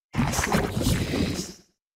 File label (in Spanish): Grito de Grapploct